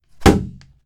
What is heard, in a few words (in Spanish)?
Golpe